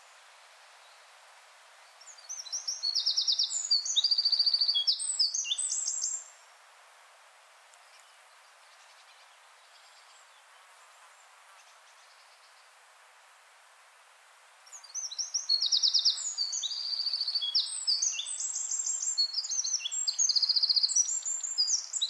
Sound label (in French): troglodyte chant